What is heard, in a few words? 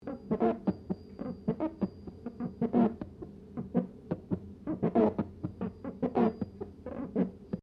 GLASS SQUEAK FINGER SEQUENCE